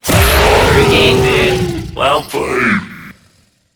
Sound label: Death